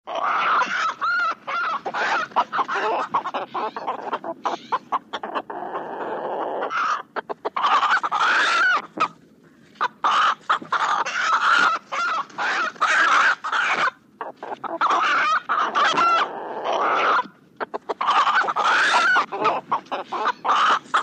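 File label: rooster voice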